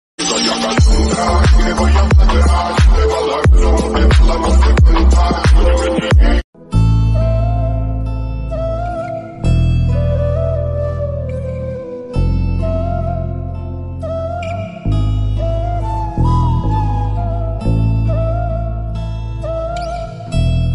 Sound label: sad bgm ringtone